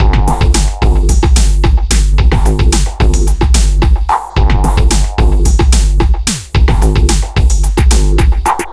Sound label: machine madness 110bpm, downbeat dance grooves